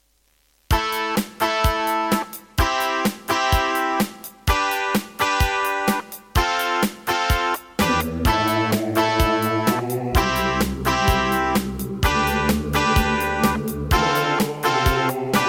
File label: Roland SCB-55; raptor call of the shadows